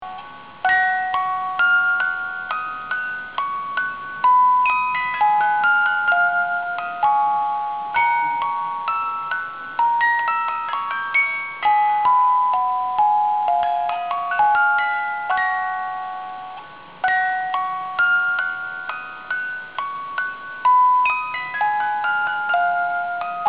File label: Air on G String